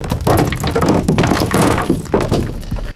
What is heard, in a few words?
heavy rocks dropping into metal container; machines